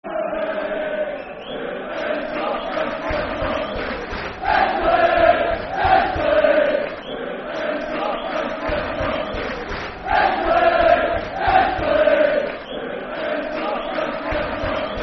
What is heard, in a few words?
Football Chant (Soccer Song); Wembley, Wembley; Stockport County Scfc